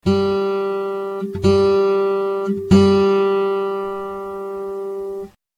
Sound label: bass tuner G